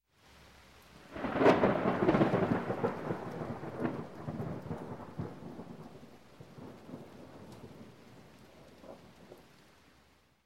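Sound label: Thunder Clap With Rain In Building; Sound Ideas 2000 Series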